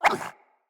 Kubrow Puppy Interact Bark Speak

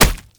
Proto Infested Step; Warframe; Brachiolyst; Steps

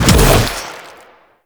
Infested Arm Cannon Shot; Weapons

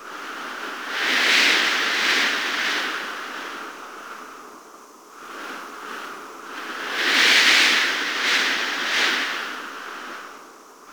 Ship Vent Room Wind Loop; Corpus Ship

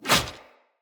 Hunter Bow Melee Whoosh; Weapons; Player; Bows; EnvoyHunterBowRangedMelee

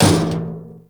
Physics Barrel Impact Medium, Metal Barrel

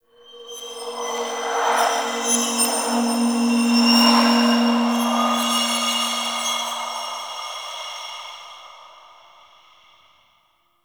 Interior Cave Spirit Gust; Warframe; Duviri; Ambience

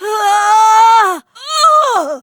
Death, Barks; Kuva Lich; Persona B

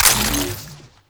Ammo Pickup Magnetic; Ammo Ability; Warframe; Warframes; Frumentarius

